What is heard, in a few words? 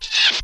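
creak of arrow hitting target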